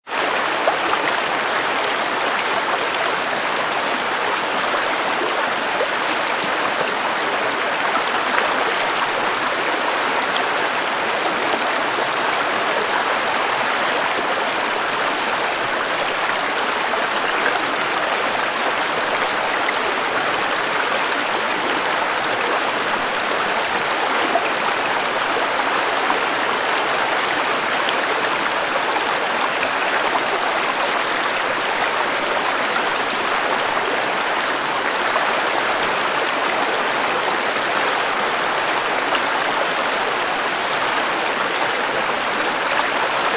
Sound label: Llano river